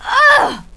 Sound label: pain, female; kingpin loc